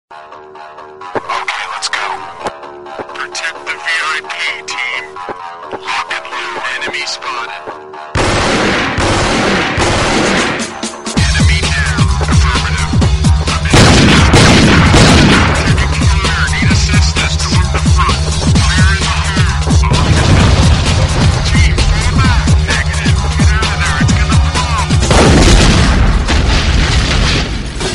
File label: REMIX GAME RINGTONE, INSTRUMENTAL; COUNTER STRIKE